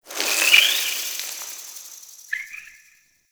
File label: Interior Cave Critter; Ambience; Duviri